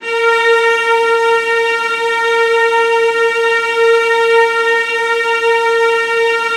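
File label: VIOLAS C5; ILIO; Synclavier Strings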